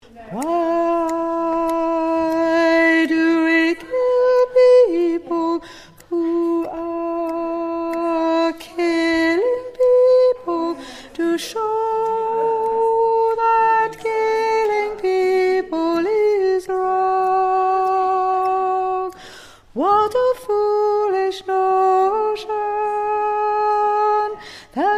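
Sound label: Soprano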